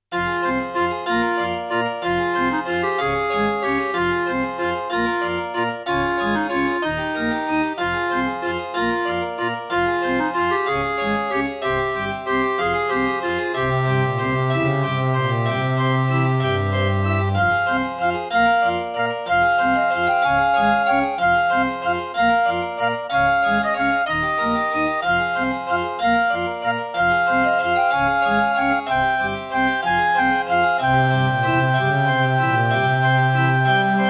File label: Track 6, Boss Dr. Synth DS-330; Death Gate